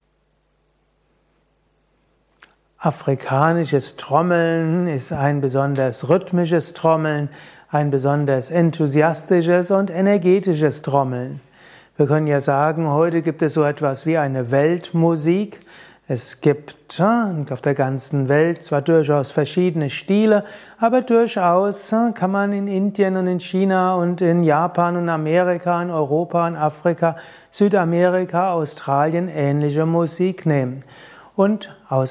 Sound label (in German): Afrikanisches Trommeln